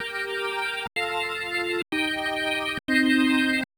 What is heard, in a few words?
125 Hammond Riffs C, Keys, Nu Jazz House; PowerFX - Nu Jazz House